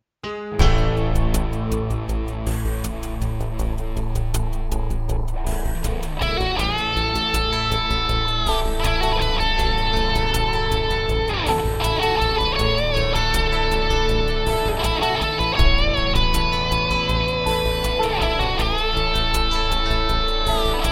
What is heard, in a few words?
Lead Guitar